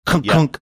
making button clunking sounds

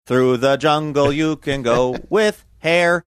singing through the jungle you can go with hair

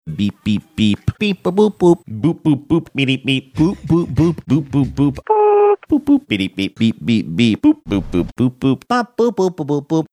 beeping booping

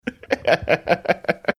chuckling

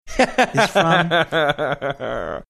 funny laugh

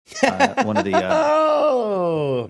laughing saying ohhhh

